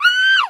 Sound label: Scared Female; friday the 13th killer puzzle